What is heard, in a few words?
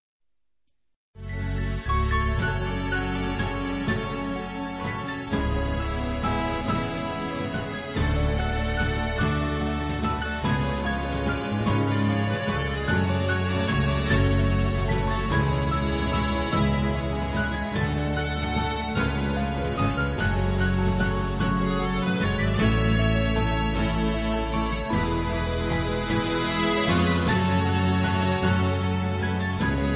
amsterdam instrumental